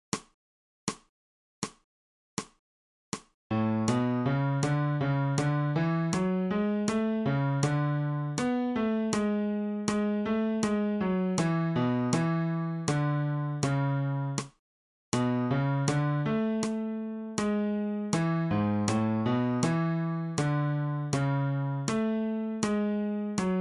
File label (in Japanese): 新フレットレス